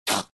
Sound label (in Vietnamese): am thanh cua mot con cho hat hoi